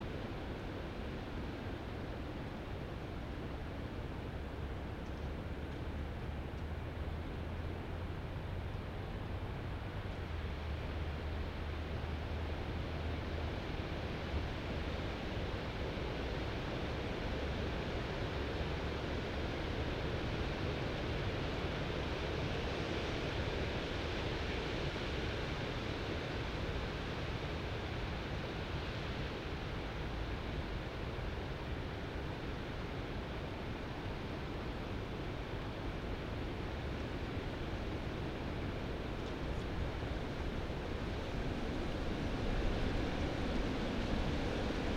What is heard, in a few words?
yamanakako sound